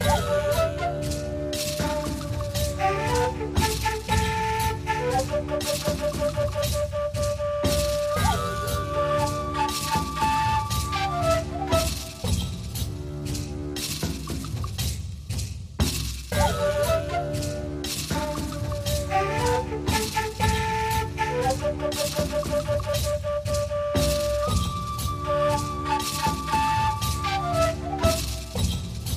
freespin ambiance; The Golden City

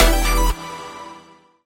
win hit; Wazdan

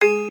step; Grand Tiger

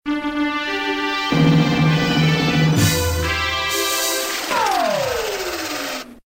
WIN MAX; Mermaids Pearl

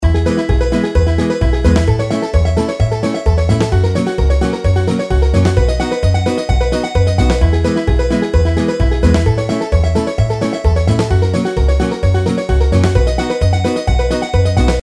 intro bgm; Cocorico

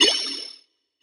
bonus symbol scale on stop; Hungry Shark; Wazdan

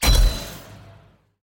Fire up; Thunder Fighter

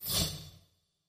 news table out; Great Book Of Magic Deluxe; Wazdan

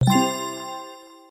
count up end; Hot Fruits 100

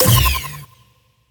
bigwin; Wazdan

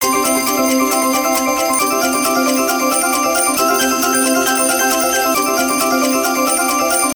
count up, slot; Hot 40

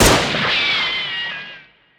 tornado; Wild Guns

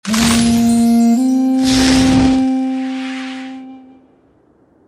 gambling win; games; Fenix Play 27 Deluxe; Wazdan

